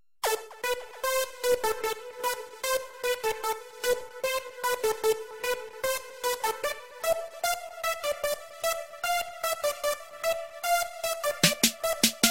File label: Rap Beats